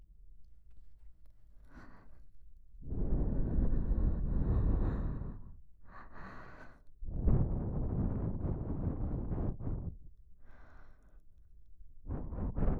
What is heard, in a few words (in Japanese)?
バイノーラル KU100 耳 ふー; 同人音声; ASMR・お手軽〜高額バイノーラルマイクの差を調査隊!!